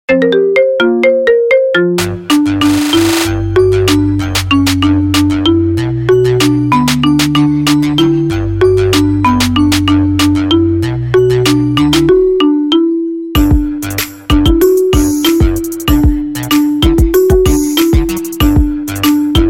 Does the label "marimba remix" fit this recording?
Yes